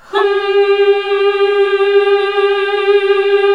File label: "Hmm" G#2, classic vox